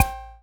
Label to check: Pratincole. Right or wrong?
Wrong